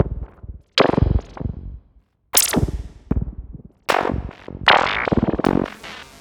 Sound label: FM Downbeat, Analog Glitch; Polyend; Stazma & Concrete Collage